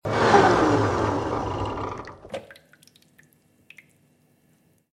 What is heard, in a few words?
chipper off